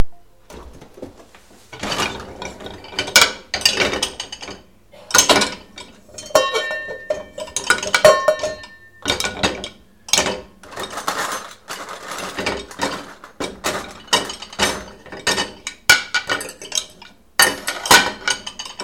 annoying sounds

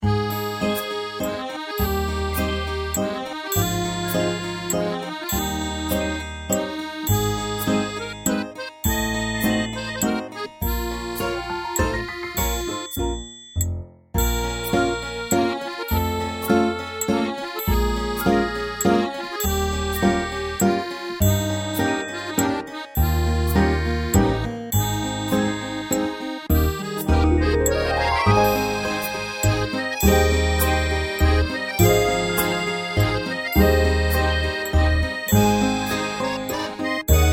3 4 waltz piece

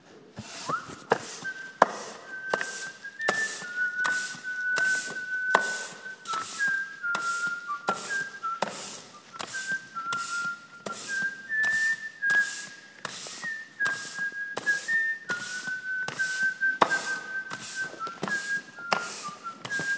whistling while sweeping the workshop floor

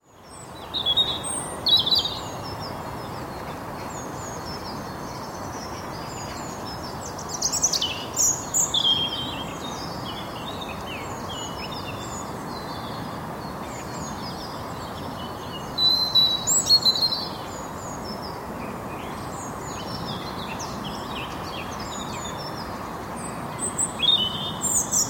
blackbird; south hill park april

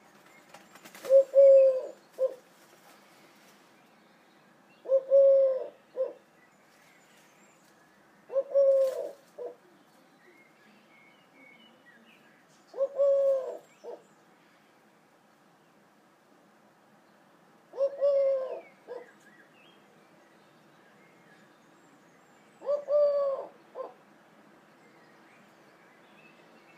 collared dove outside the bathroom window

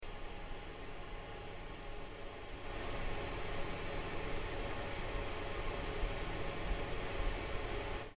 computer; indoor; Fermynwoods

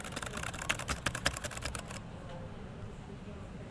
nethermoor lake; killamarsh

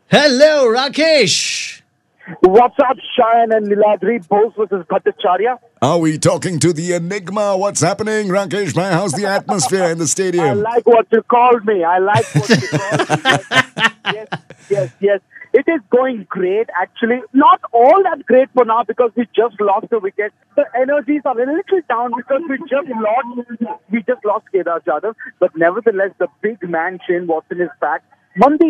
crowd cheers

